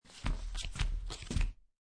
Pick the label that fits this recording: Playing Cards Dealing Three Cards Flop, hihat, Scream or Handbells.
Playing Cards Dealing Three Cards Flop